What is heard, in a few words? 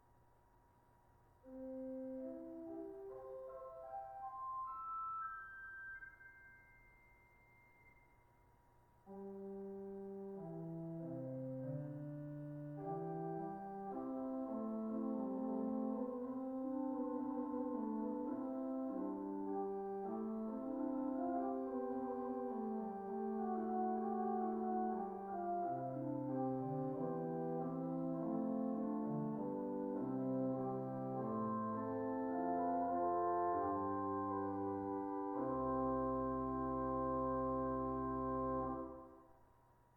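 positif gemshorn, organ; 4’ Gemshorn; The modern Gemshorn is a foundation stop of conical construction; Positif Division (Balcony Lower Level)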